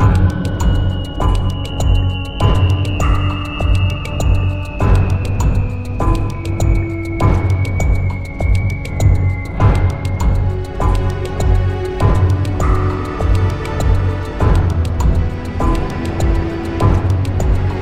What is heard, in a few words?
combat